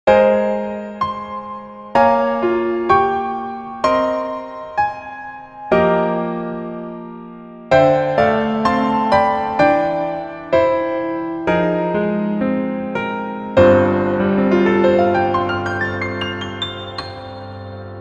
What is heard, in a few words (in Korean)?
Piano Ver 멜로디MR 여자키